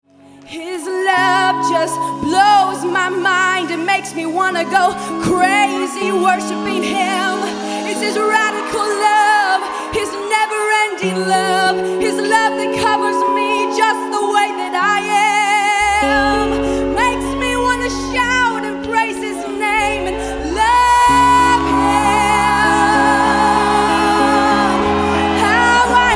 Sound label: Spontaneous Song